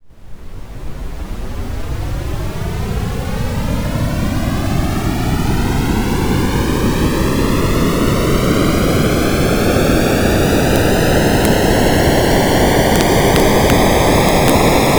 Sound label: throttle up